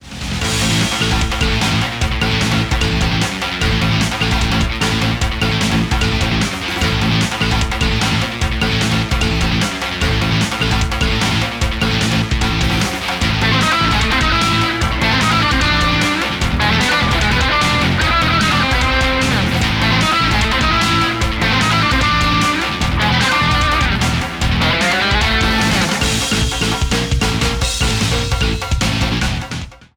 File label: Jungle Retreat